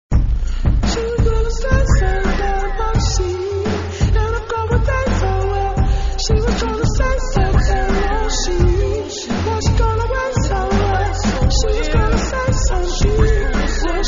Cry

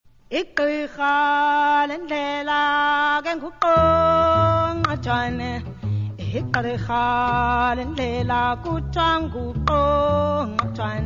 Click Song

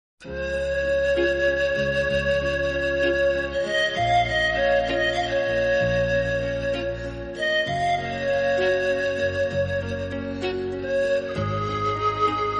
Peruvian Panpipes

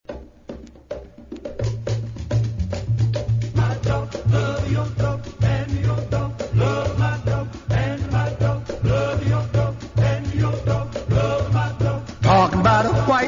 Dog Song

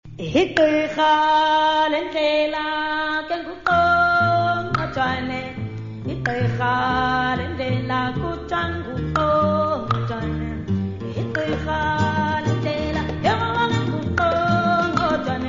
Click Song

